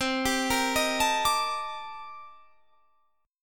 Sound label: C-Minor 13th-C-down, guitar; arpeggiated; JGuitar